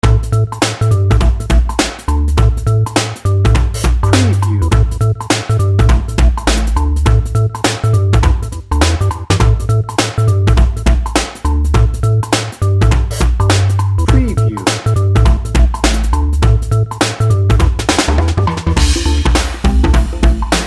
perky funky comedic

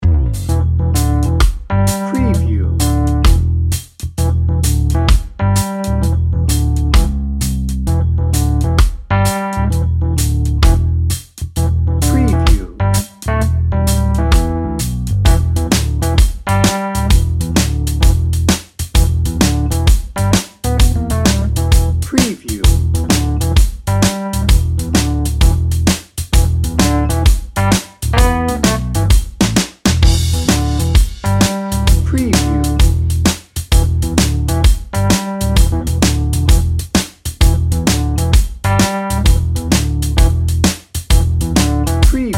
day trippin bed

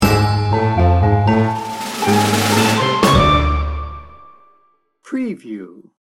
funny business sting bumper

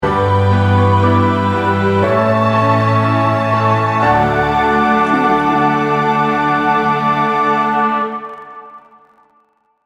pachelbel canon in d sting bumper

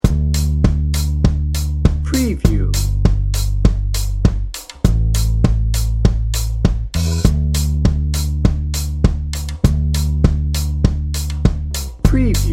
americana sunrise

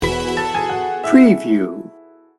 comfort zone sting bumper

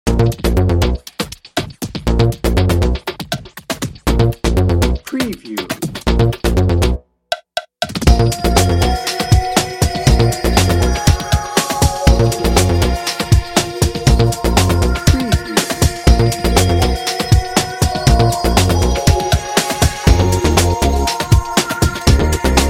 adventure beat

